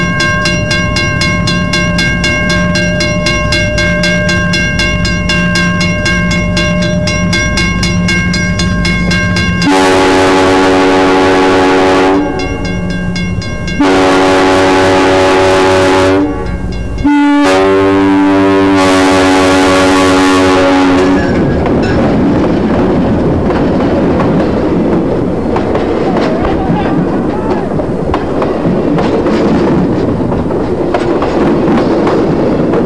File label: pass train